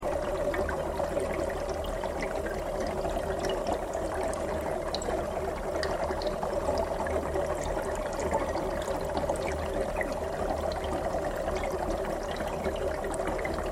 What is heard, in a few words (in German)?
blubbern